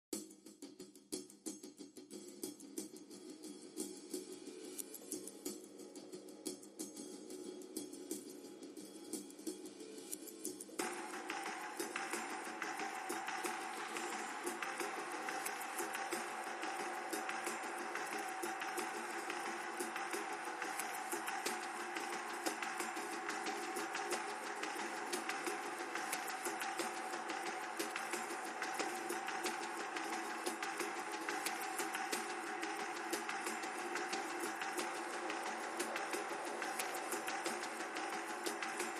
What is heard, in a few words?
Drums Only